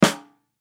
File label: Drum Snare; Snare 14 Inch Pacific Single Hit; Drum Kit Sound Effects; Blastwave